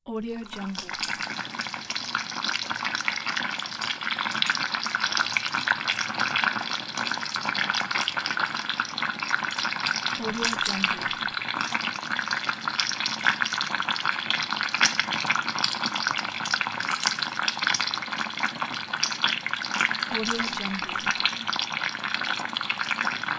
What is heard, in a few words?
cooking